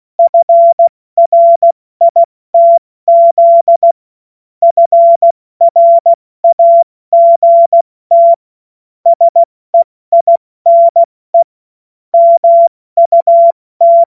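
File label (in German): Witz, Morsekurs, cw morsen; Witze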